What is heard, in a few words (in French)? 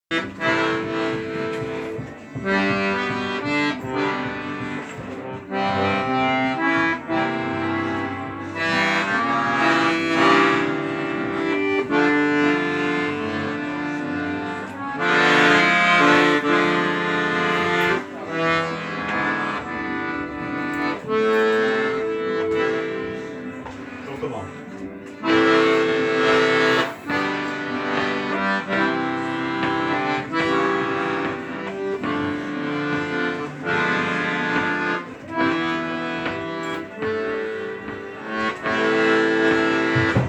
Accords Intro